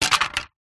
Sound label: insulation tile pieces drop; foley; zapsplat